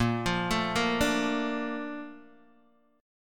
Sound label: Bb Major 7th, Bb, down, Guitar, DADGAD